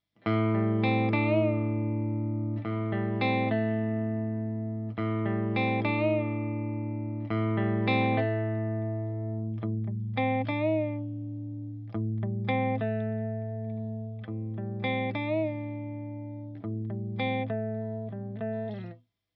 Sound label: clean mid